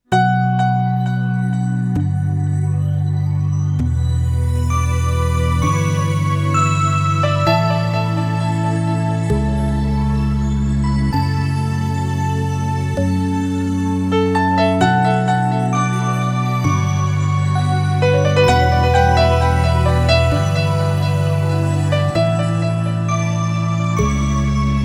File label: Piano Melody; Vaporwave